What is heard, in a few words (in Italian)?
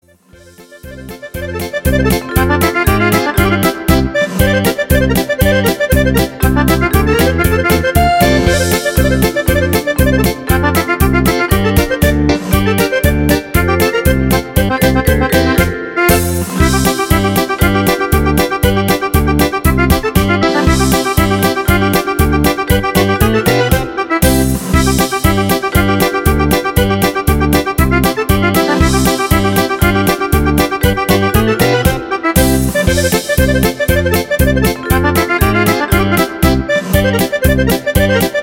Suona La Fisarmonica Polka Canto